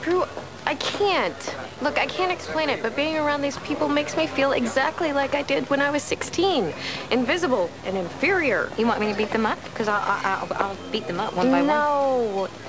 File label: beat